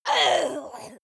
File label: witch shout groan